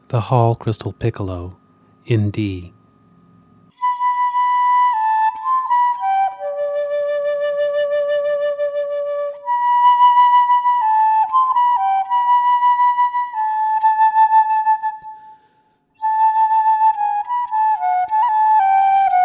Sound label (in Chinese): 水晶短笛D调